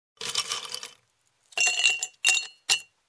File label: Filling Ice Cubes in Drinking Glass